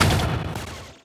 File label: Impact